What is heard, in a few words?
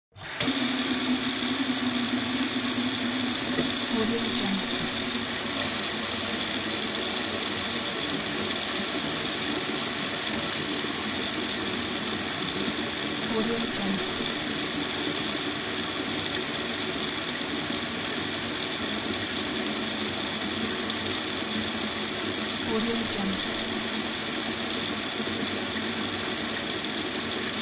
a kitchen sink faucet